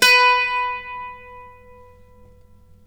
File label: b3, Mandolin; Guitar; Terkelsen